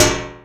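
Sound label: metal; Pro-Rec - Synthsonic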